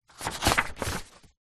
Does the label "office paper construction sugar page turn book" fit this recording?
Yes